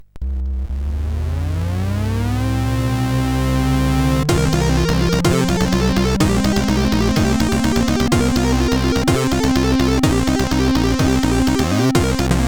Intro sid CSG8580R5

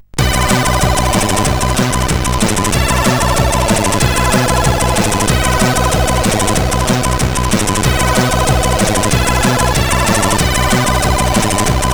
Quick Test Tune, CSG8580R5

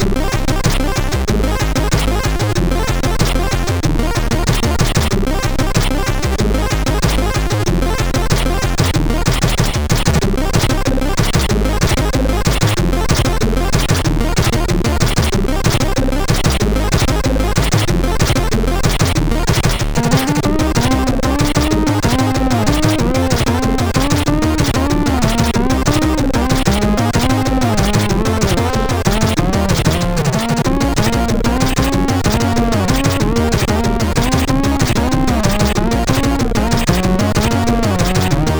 sid MOS6581R4; All Out King

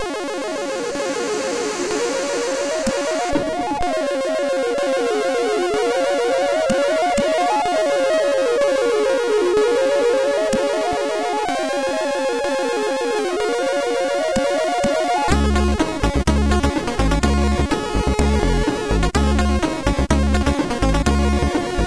Poly 800, MOS6581R4

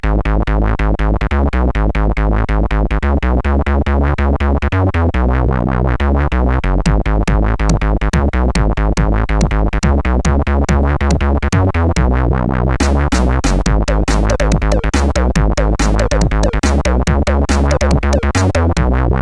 Funky, sid CSG8580R5

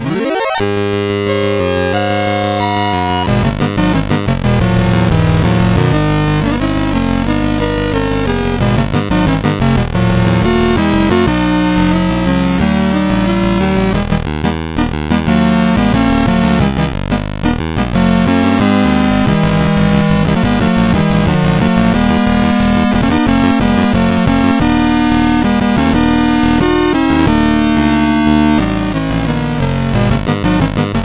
Battle Medley, sid CSG8580R5; Final Fantasy

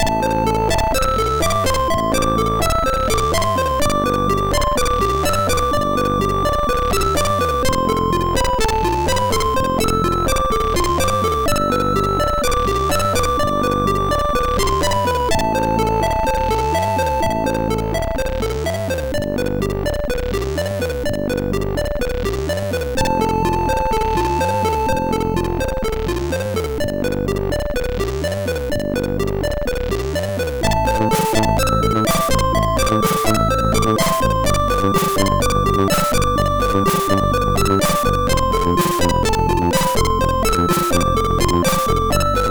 Lazyness end, MOS6581R4

